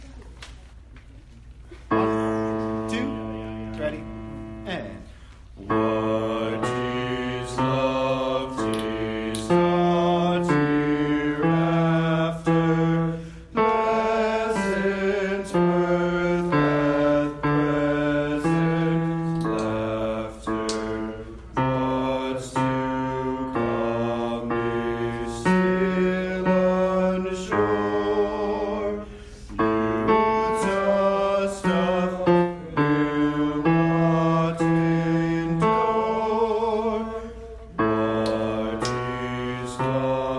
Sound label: bass; love